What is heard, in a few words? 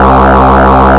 lead; Mono